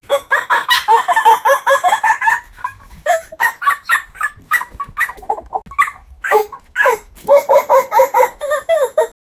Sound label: dog laughing sound; humor